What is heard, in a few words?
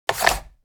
Checkers game sliding piece; leisure